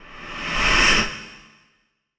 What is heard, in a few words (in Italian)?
Lamento Spettrale